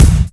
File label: BD, korgdrums